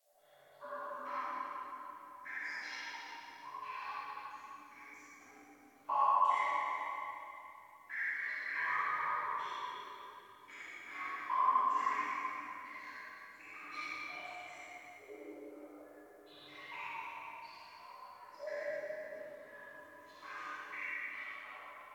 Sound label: Water Drips; Ambience; Warframe; Nineteen Ninety Nine